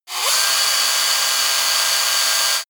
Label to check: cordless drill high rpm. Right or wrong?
Right